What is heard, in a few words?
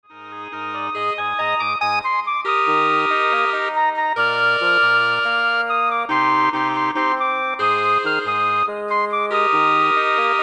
Woodwind Ensemble